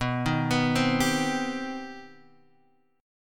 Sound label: C Minor Major 7th B